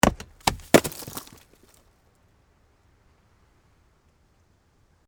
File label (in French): pierre